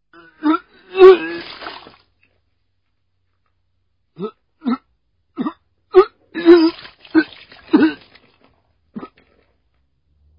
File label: Vomiting